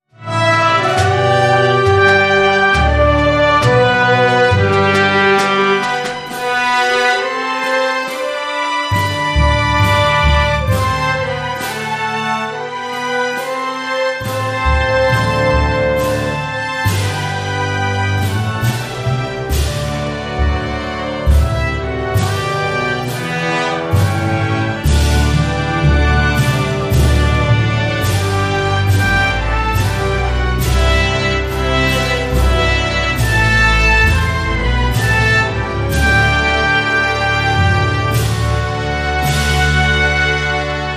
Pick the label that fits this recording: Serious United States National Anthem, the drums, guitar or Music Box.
Serious United States National Anthem